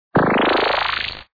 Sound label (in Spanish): Laser Transforma; Fernanfloo Saw Game Online